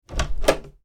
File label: household door wooden internal open; Door Opening - Wooden Internal Door Opened; Miscellaneous Doors; McKinneySound